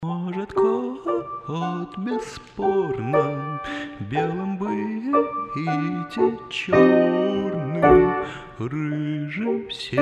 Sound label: Vocal